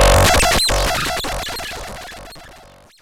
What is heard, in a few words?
Electric Discharge, Cwejman S1